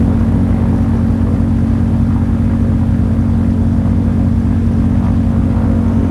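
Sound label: morava cockpit left, engines; War Thunder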